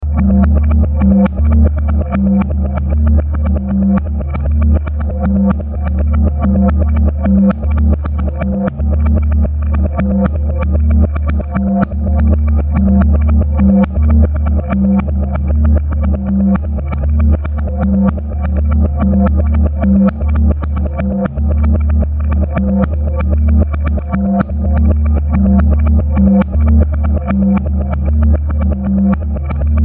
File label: Beat Loop Tabla Filtered Reverse Ambient Wash